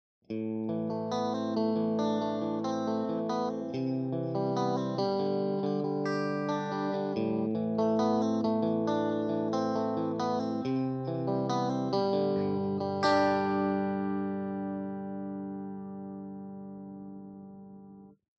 di fender 59er strat; Line 6 Variax JTV-69

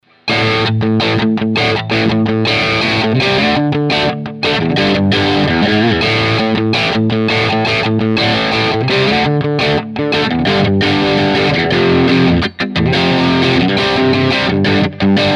framus artist phil xg p90 p90 crunch steg

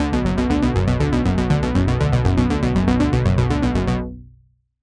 arpeggiator laufrichtung down up

